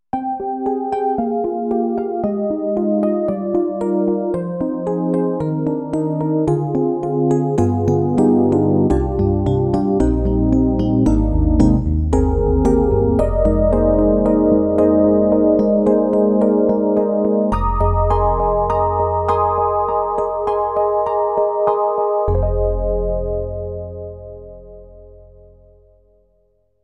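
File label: SD-90 Classical Electric Piano Detuned; EDIROL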